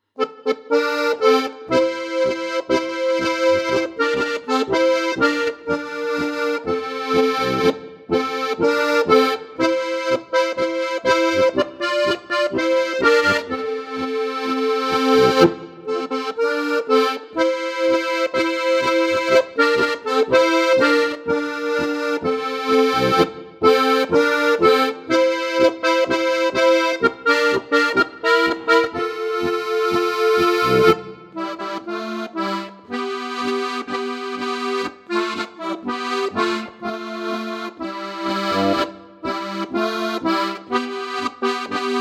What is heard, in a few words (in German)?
Tango